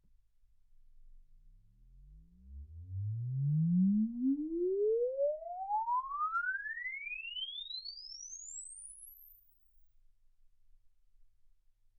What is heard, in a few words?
exp swept sinus 10Hz 24kHz